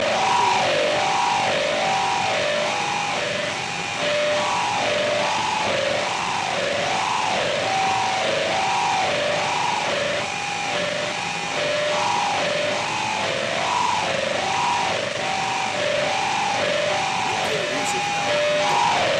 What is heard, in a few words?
sci-fi chaos alarm loop